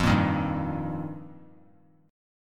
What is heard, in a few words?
F 7th Suspended 2nd Suspended 4th, Bass Standard; F7sus2sus4; strummed; JGuitar